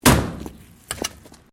Bricks Metal Movement

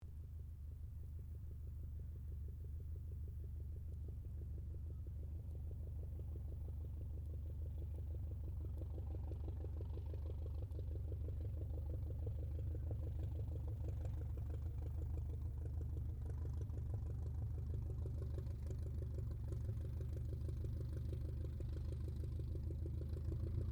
AIRCRAFT CAC WIRRAWAY TAXI DISTANT; CA-16 Wirraway; Airplanes; Vehicles; Aircraft Collection; Twistedtracks

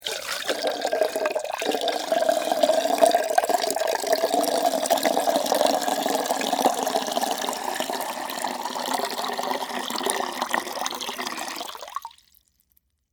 Water Pour Small Pot, Into, Metal, Antique, Long; Pouring Sound Effects; BlastWave

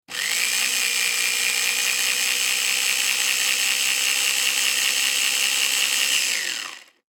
Household Blender On Run Off; Kitchen

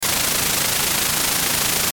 static chopper; Static Chopper Hiss; Production Element Imaging Element Accent Transition; Blastwave

